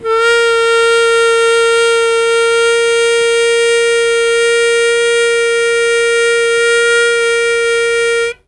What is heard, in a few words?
Long Sustain Aa Bb1, Harmonica; Zero G - Harmonica